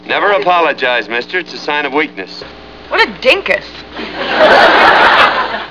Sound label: Laugh; Laugh in